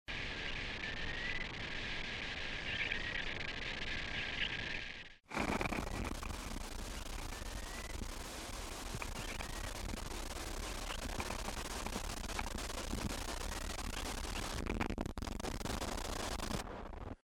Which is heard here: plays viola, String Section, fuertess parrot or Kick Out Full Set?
fuertess parrot